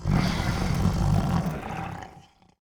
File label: dragon boss sleep idle, monster